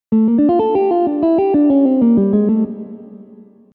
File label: Arpeggio